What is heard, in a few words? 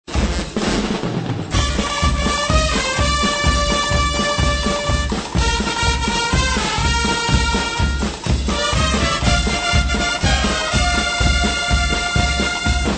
guggamusik